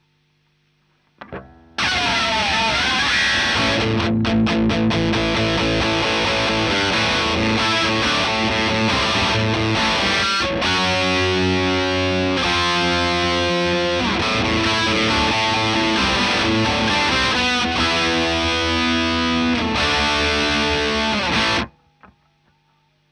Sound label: Marshal Gtr